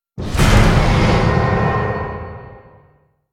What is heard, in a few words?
Stinger Action Short